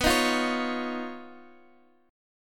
Eb 7th Suspended 2nd Sharp 5th, B

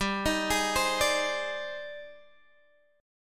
Ab Major Sharp 11th G

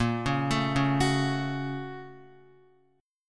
A sharp Minor Major 7th Sharp 5th, down, Guitar; A#mM7#5 arpeggiated; JGuitar

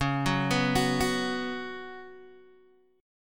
Db 7th Suspended 4th Db down Guitar Open G

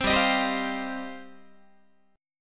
G 7th Suspended 4th Sharp 5th, Guitar

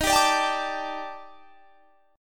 Eb Major Sharp 11th Eb; EbM#11; strummed

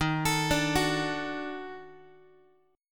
D sharp Minor Major 7th Flat 5th D sharp Guitar; arpeggiated

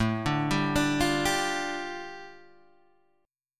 A 7th Suspended 4th Sharp 5th A, Guitar Open D; 7,5,5,8,8,5

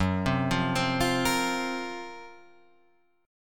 F sharp Major 7th Flat 5th F sharp; x,9,10,10,12,12,12,x

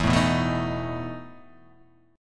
E Major 7th Suspended 4th E

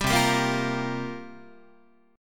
C 7th Suspended 4th, Guitar, chord; C7sus4 strummed

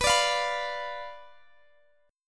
B Diminished B Guitar Open G

